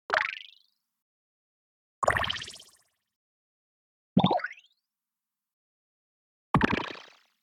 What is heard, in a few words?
ui click clay plop various positive ui funky; UI Sounds; bubble button; Funky Audio; FASS